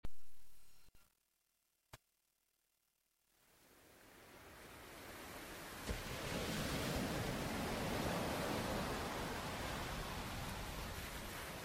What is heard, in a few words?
Surf and Sand